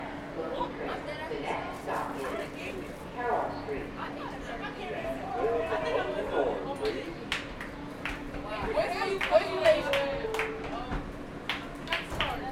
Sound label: NYC Subway Stomp